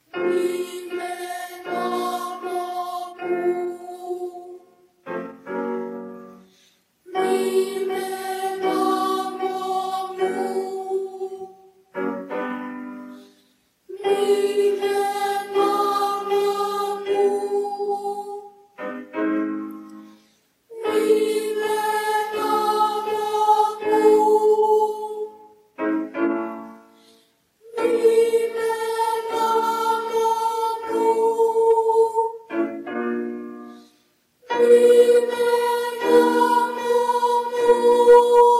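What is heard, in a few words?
Exercise Vocalization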